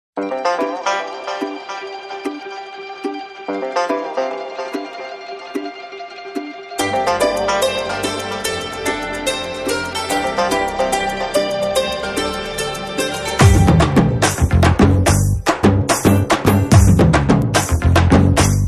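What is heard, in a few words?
background music Ringtone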